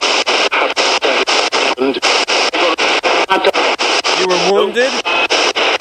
wounded

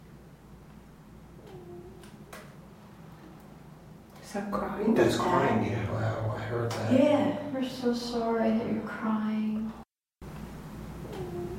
Cry

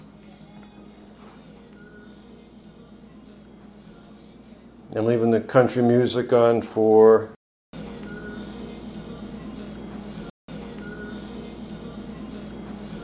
Whistle

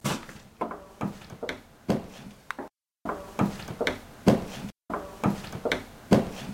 Close The Door

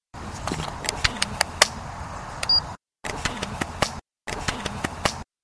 moan; Thompson-Taylor EVP #4

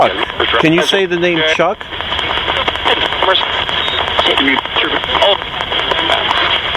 meter off; GhostBox #2

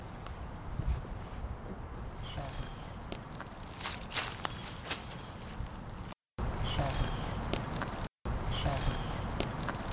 Shout; Rose Hill 5 EVP #9; another class A EVP is captured of a female spirit

